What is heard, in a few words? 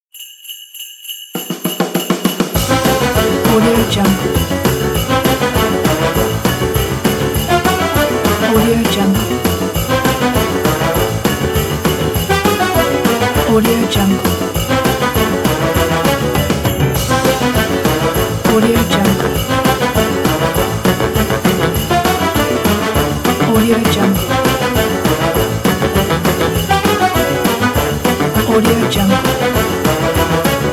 christmas boogie